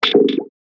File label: Strange Plop Sound, cartoon sound effect; Game Fx; Cartoon Sound Design Sound Effects